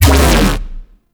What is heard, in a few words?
Note Trigger Corrupt Sound; Warframe; Bard Quest; Bard Boss Fight Notes